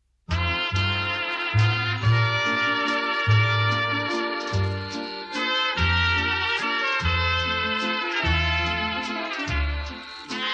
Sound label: Waltz